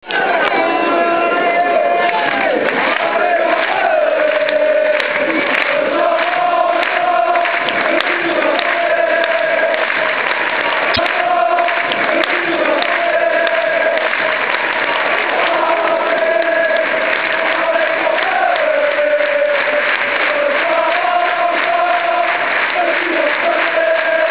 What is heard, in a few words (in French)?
Ce soir encore le Sud va chanter